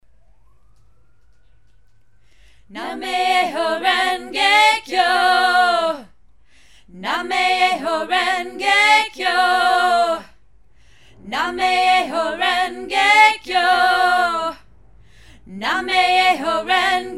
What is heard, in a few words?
vocals